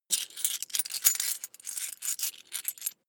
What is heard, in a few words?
coins big search